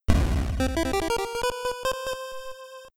Door Reveal

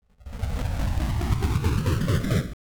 ion lift off

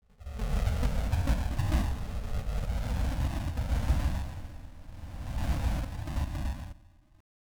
lift off

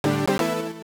Word Get

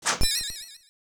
Armor Deflect

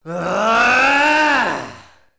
shout

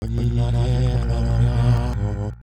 Intense

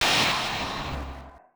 Dismiss

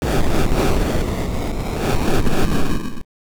Fire

